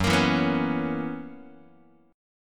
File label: Gb 11th, Guitar; strummed; 4A,4E,5A,5D,5G#,6C#